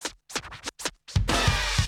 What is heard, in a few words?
Scratch & Hit